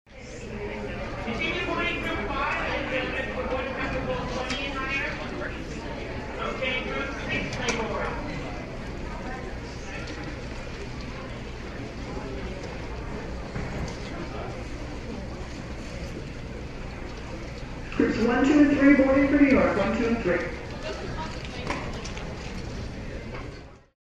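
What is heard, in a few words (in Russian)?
экологический звук у ворот вылета иностранного аэропорта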